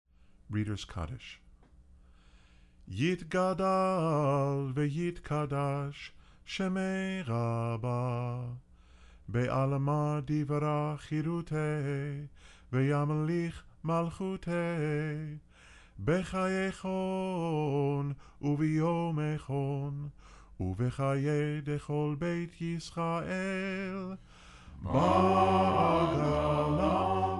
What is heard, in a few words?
kaddish, cantor